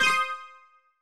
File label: confirm, tones; inteface sfx pack 1